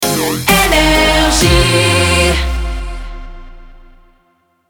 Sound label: Jingle Rapid Fire; NRJ